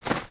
Footstep; Lego; Strombie; creatures organic

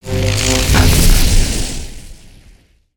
Javelin Charge

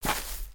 Footstep Grass

